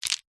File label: stones pebbles pick up; foley